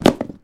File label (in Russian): ustavshij brosok botinka na pol